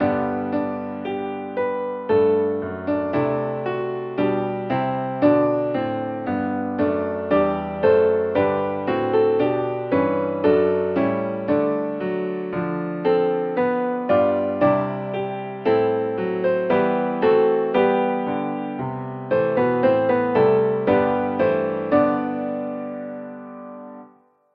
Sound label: accomp; When In Our Music